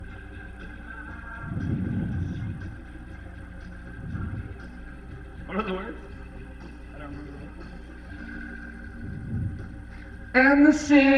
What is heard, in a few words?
VOX Leslie